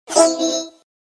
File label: Laughing